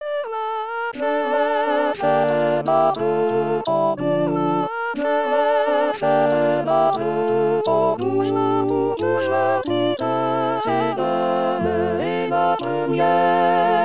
leroi sop; 2023 st jean

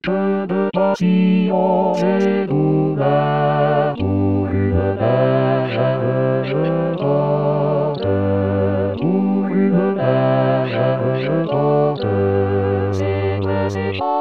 basse; rimailleurs

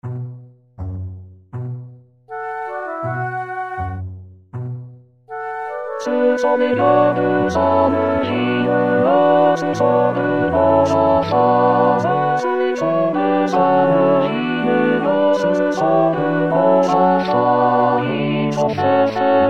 senneville tutti; 2026 saint jean

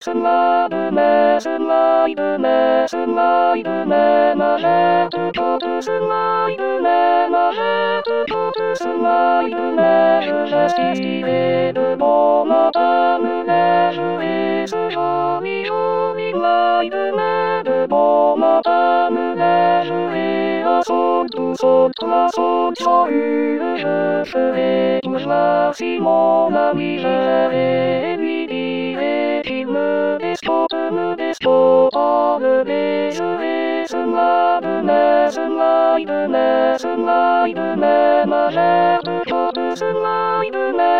mai alto; 2023 st jean

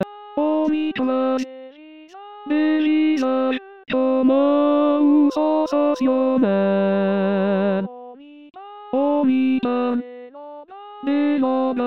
hommes

